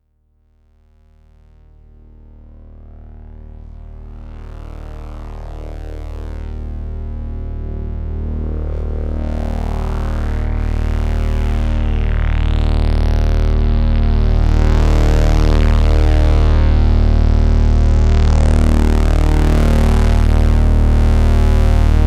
MicroBrute Slow PWM

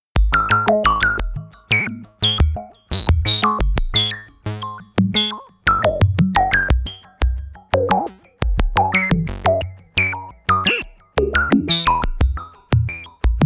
jupiter4

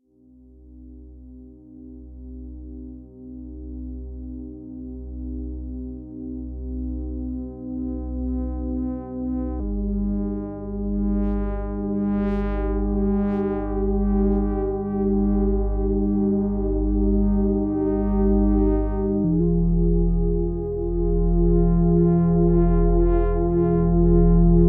pro1

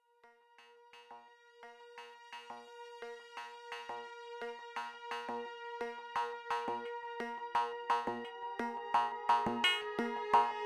OB8; DominionX

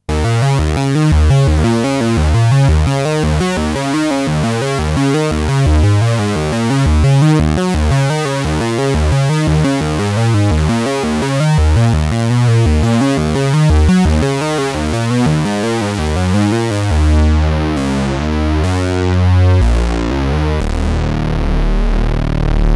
Feedback; Dominion

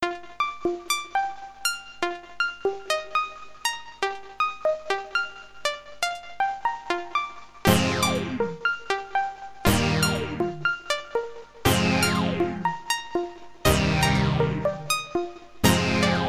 trident; Les Indes

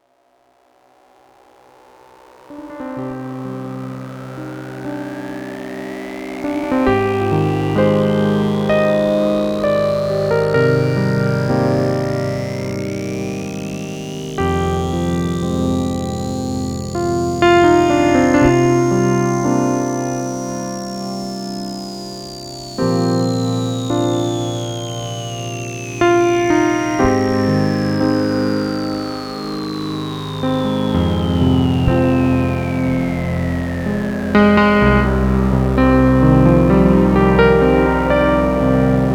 Dominion X Mix CP70

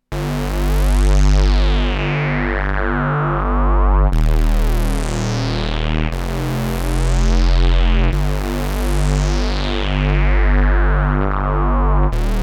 Volca Bass sqr

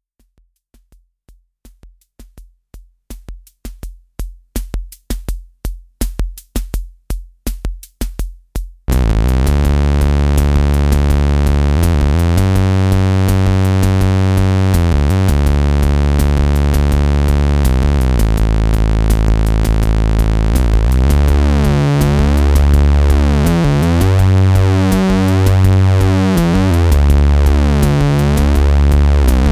Boomstar 4075 VCO1 2 Sub Osc

